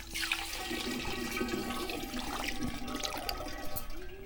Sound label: pour